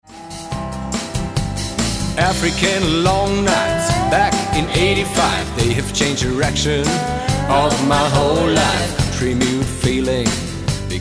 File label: london streets picking